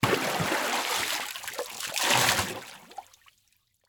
Liquid Water Water Plastic Cups Scoop Up Pour Water